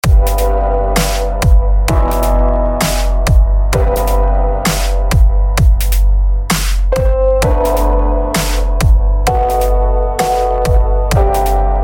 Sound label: Piano Dubstep